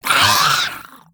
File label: Rat Play